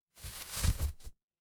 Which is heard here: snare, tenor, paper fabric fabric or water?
paper fabric fabric